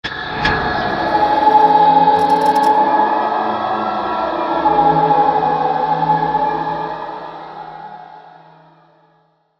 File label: Hit To Whoosh; Production Element, Imaging Element, Accent, Transition; BlastWave